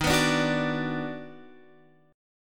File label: Eb Minor Major 7th Sharp 5th, down, Guitar, Open F; strummed; chord; JGuitar